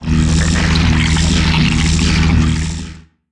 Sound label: lair, buildings; Factorio Paranoidal mod; erm zerg hd assets